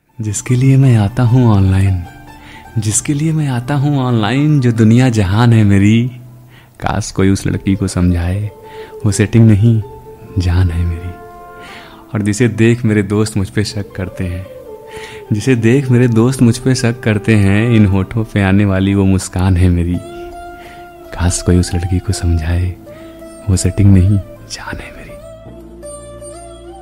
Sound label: Sad Status Ringtone